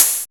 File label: FX Korg KPR77; Best Service Gigapack 2